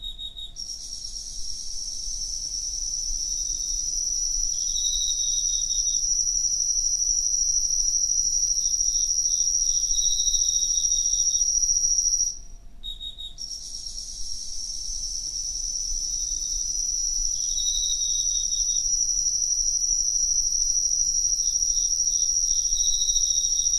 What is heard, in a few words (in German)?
Grillen